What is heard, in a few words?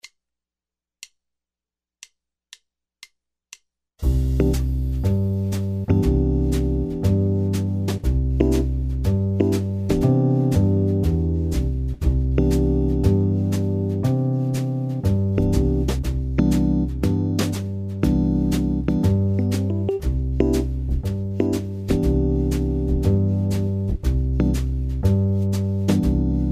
C major play along, backing tracks